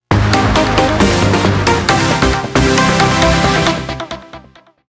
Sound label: jingle